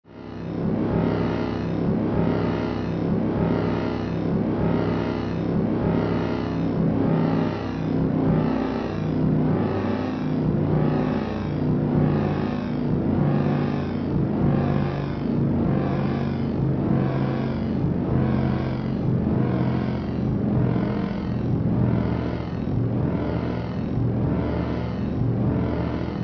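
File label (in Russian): гул и затихание инопланетного устройства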